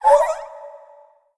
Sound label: ghast teleport; Crypt of the NecroDancer